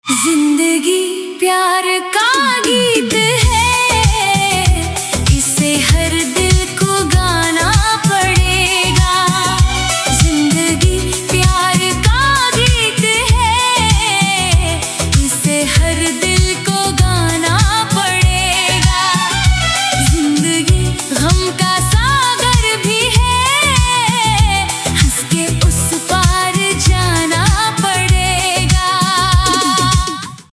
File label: AI Female Ringtone; AI Cover